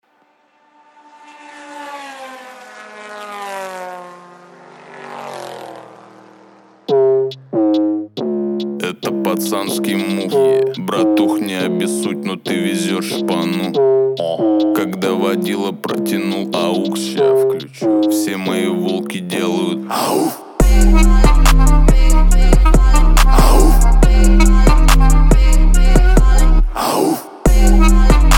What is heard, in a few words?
37 39 41 Hz Low bass